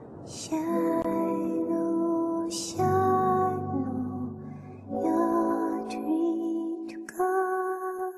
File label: Lullaby; Wolfs Rain